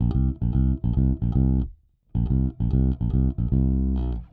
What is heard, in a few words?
Rock, Bass; Bass X